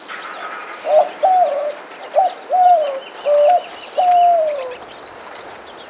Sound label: White winged Dove Zenaida asiatica asiatica